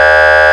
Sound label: horn, sirens; federal sig omega 90